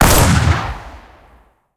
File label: vickers s shot, guns; War Thunder